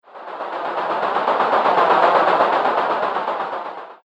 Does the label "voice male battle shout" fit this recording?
No